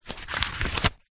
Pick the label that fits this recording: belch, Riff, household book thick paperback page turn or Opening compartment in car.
household book thick paperback page turn